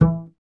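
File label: string contrabass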